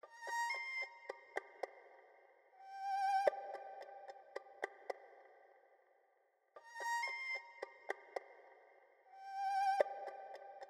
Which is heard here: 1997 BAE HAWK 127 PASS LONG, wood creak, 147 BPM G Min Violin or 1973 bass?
147 BPM G Min Violin